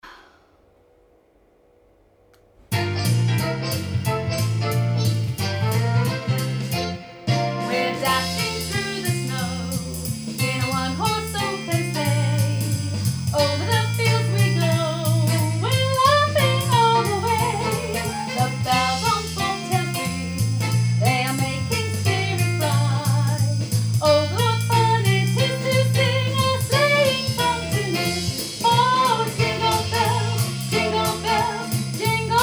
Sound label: Jingle Bells Voice